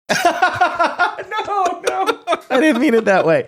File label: laughing saying no i didn't mean it that way